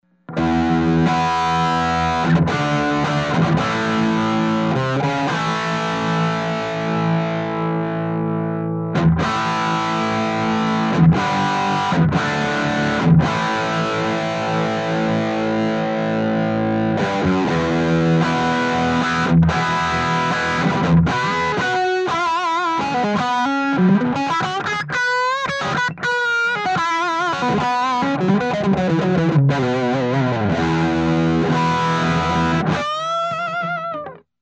hum dirty

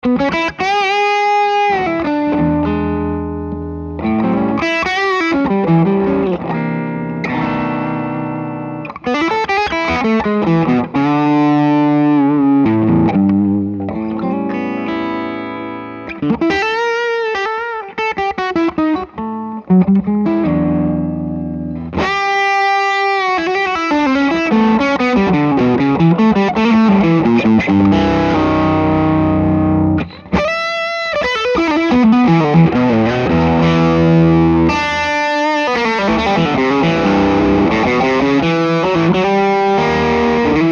drive; Blackhawk

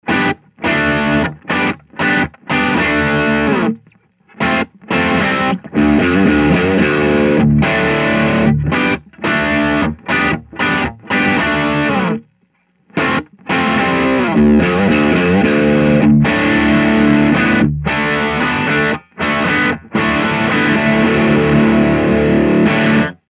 g15c dirty

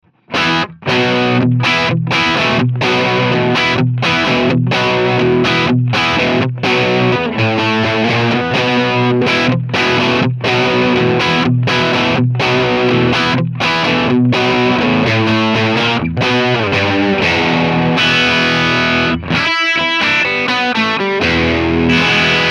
Green Beret 10 - Dirty 1 - Matamp GT2 - PRS Cus24

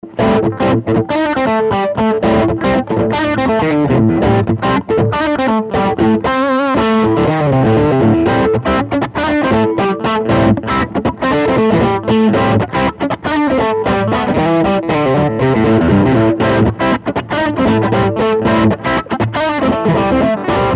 G10CS drive

